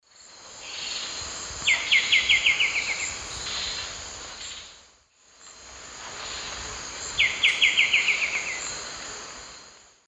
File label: Pachyramphus polychopterus, song; S. America; Eastern Lowlands